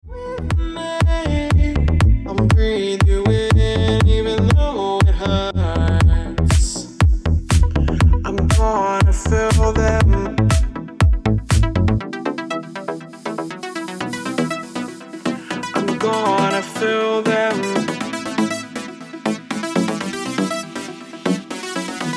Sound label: Remix Ringtone